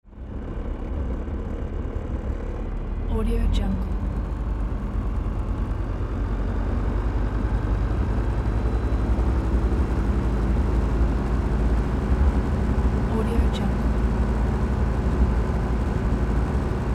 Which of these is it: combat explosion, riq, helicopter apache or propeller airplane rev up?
propeller airplane rev up